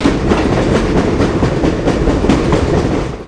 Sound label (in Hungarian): Mozdony; Pure Pinball